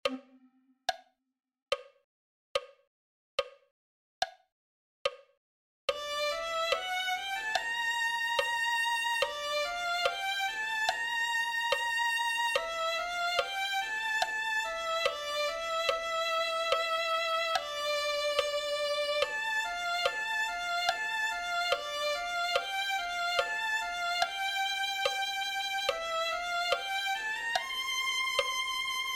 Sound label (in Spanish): a dios sea la gloria violin